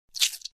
Suck; Lewd SFX

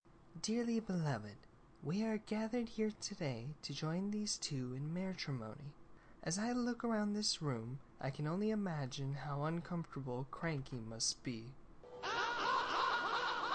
Ponies Laughing

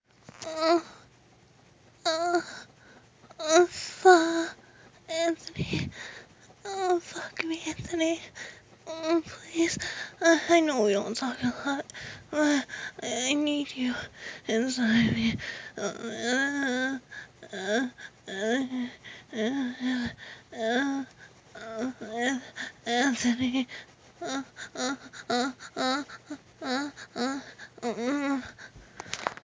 moaning, short, breathy, Moans; F4M; moaning my cousins name

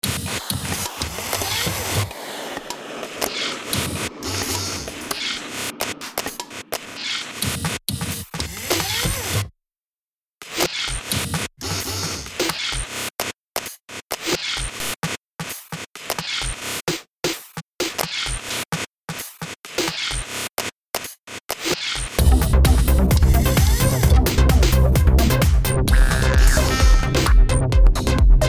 prog electro tech

